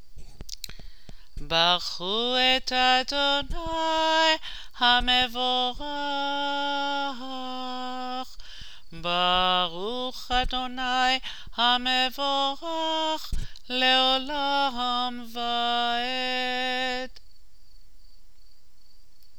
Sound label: barchu